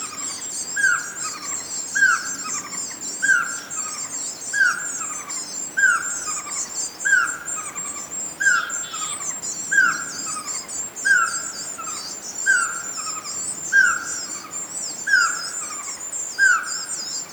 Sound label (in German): Sperlingskauz; Allgaeuer Alpen OA; Daniel Honold